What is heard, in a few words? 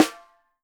4X10 SOPRANO, Drums; Sampleheads; Peter Erskine Living Drums